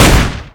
amr shoot; weapons

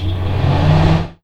fireworks reload wave start; weapons

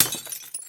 mlay break, weapons

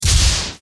gun clap

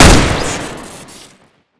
crit, weapons

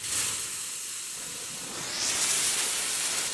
cannon fuse; weapons

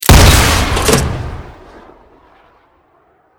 mortar shoot; weapons

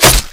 harpoon in; weapons

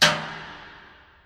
bucket on; weapons

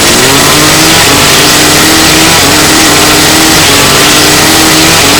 chainsaw fire crit; weapons

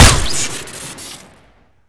opressor crit, weapons

